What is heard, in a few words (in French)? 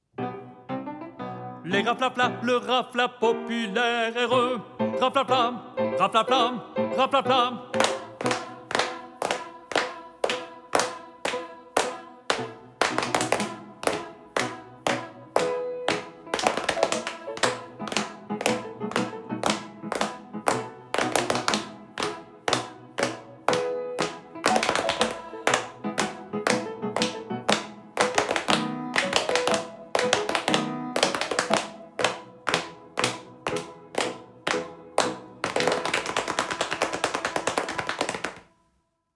Le tambour raflafla + claps